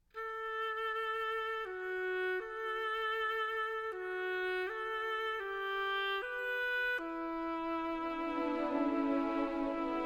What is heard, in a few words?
Orchestra Choir Tracks; Come Lord Jesus